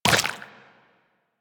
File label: Step Goo, Footsteps Walk; Warframe; Tenno